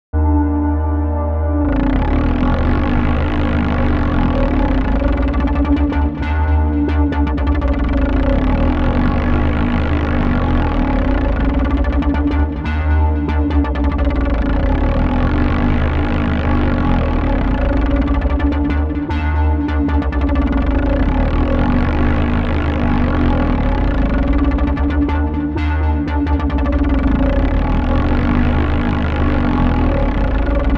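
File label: rhythmic kochab hold key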